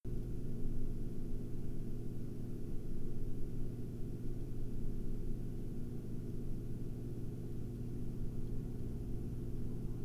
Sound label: 1000 RPM